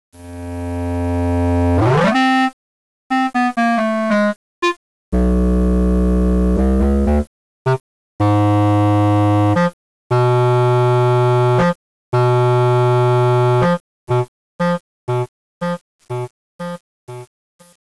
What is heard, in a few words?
cartoon; de yo comics